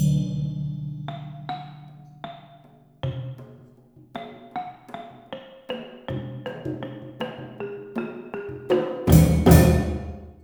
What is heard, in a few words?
MALAY PHR L, Heart of Asia